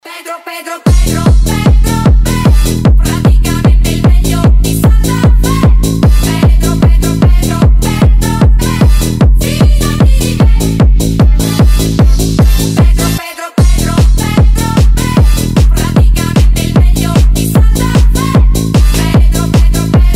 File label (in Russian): Rington na zvonok telefon